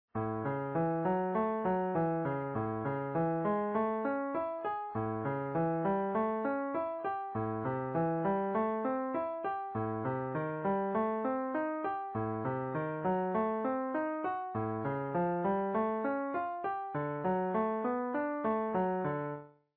arp

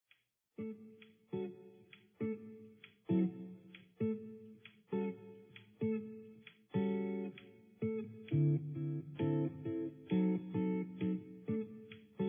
tonic one note blues

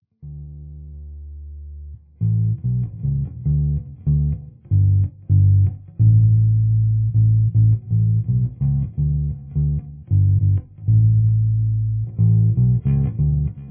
bass

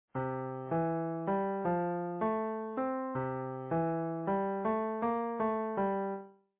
rr bass; scale arpeggio

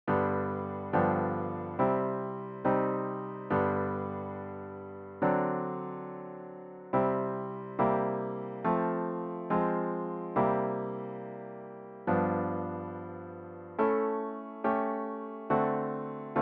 chords, blues

